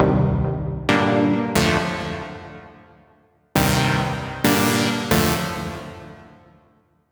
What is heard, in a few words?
House Chord; Techno (Техно)